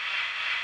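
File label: Broken Wand Headbanging Loop; Brkn Wand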